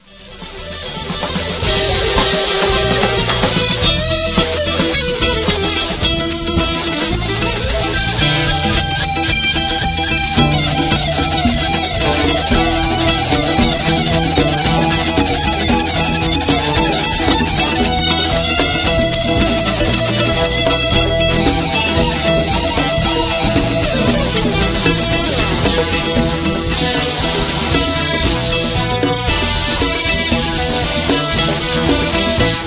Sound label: synthphony